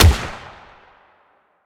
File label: hmg Shot; Security; WEAPON